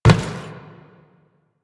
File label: footstep metal; Enemies; Warframe; Teshin; DE